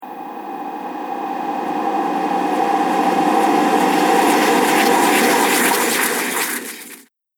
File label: Tree Spirit Attacks, magic; Entrapment - Tree Spirit Attacks; Fantasy Sound Effect